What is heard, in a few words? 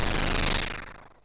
SFX, MOS6581; Narco Police; MOS6581R3